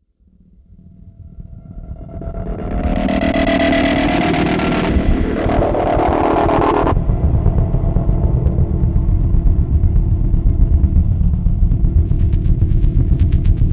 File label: Interference Field Transmission